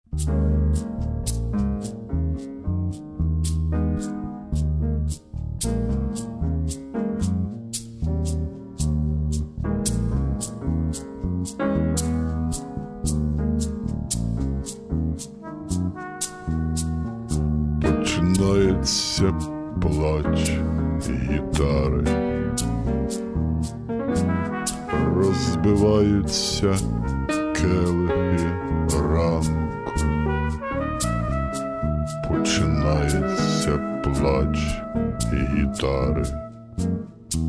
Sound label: gitara